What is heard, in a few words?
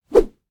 Whip; 1001 Sound Effects